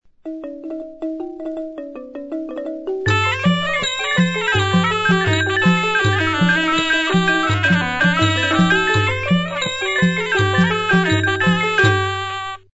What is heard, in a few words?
ensemble, cambodia